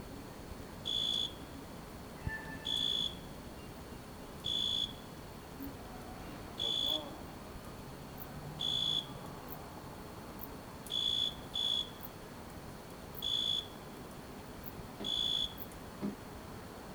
Single night cricket; SFX; IRL Recorded

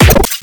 Audio Corruption, Electronic

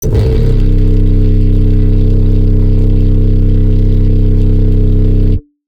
Machine Bass; 808

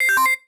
Info, beeps; SFX

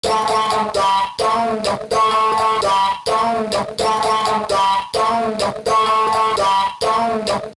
Guitar 128 BPM, loops

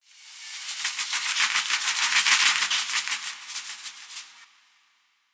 Shaker

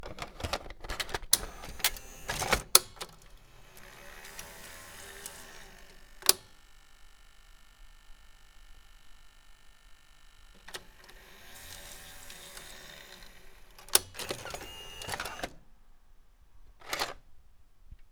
Sony UVW Tape Insert & Eject; SFX; IRL Recorded

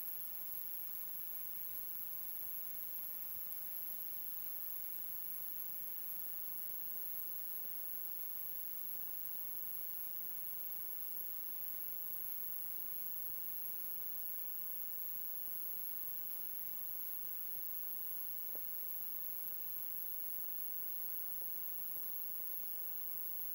CRT High Pitch Ringing; SFX; IRL Recorded

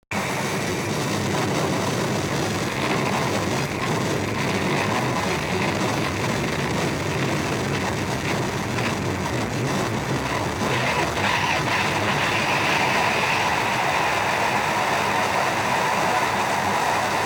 Digital Radio; SFX; Experimental - Other

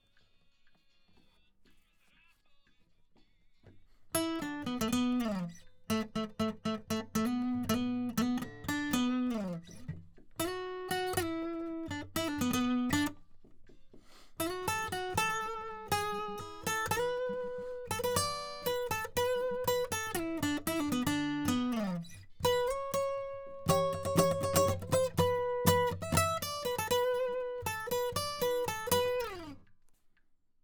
Solo; Reaper project

